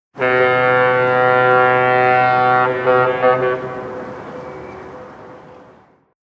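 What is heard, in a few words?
Cities New York City Foghorn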